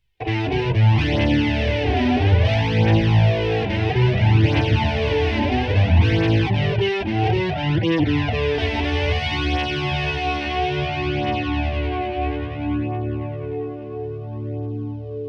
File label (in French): disto flanger, guitare